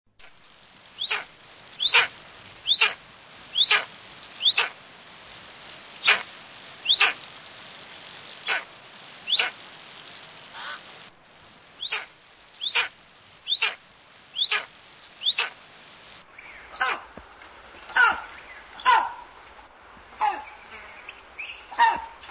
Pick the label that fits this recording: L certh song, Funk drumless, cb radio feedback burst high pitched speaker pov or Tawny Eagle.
Tawny Eagle